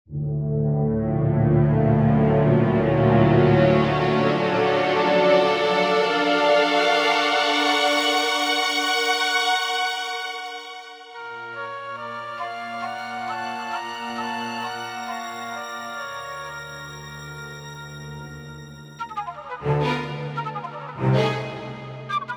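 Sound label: orchestra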